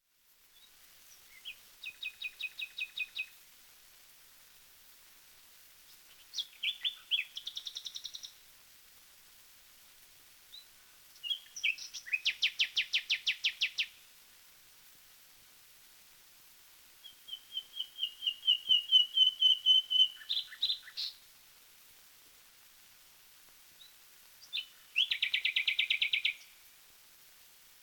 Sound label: nightingale song, songs of wild birds; 1936